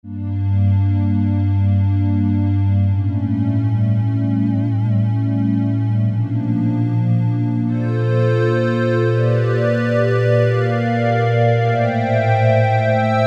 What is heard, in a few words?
Pad; Piano - Keyboards